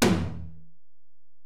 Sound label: Tom, Alesis DM-PRO